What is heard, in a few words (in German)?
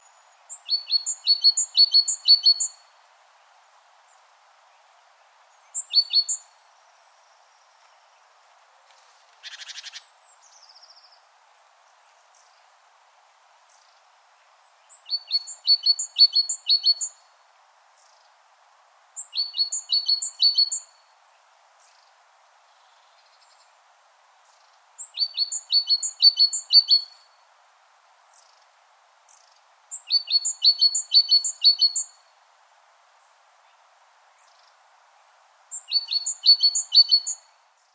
Kohlmeise Warnrufe